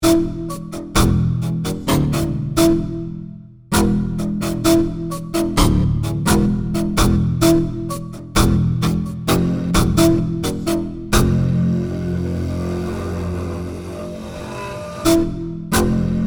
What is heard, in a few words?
Omnisphere Bow